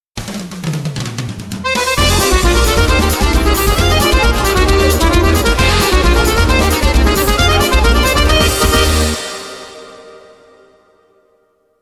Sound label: viva samba